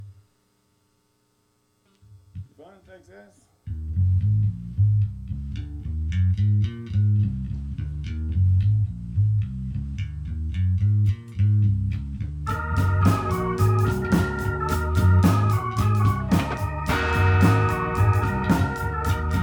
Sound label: funky; h4n